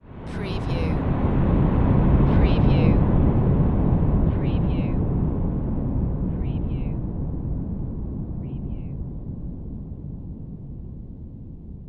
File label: Swell Dark